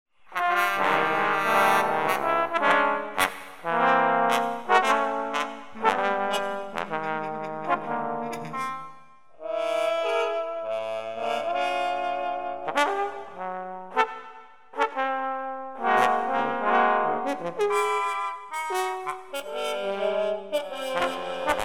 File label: piano and orchestra; stravinsky; mvts for piano and orchestra mvt5